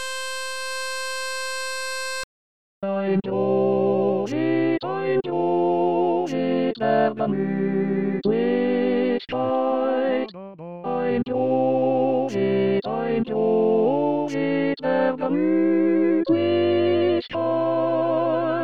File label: Bari, Barbershop